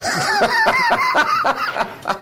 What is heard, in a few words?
Laugh; why are you gay